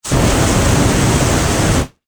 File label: Flame Hand Booster, Scifi, Flame, Hand, Booster, Long, Burst, Science, Fiction; Spacecraft; Spacecrafts; Blast Wave